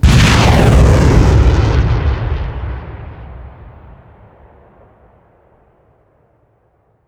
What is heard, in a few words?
trail, rocket; gmod; arccw uc commons attachments